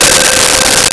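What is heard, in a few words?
Square Wave C5; Nintendo; July 2020 Gigaleak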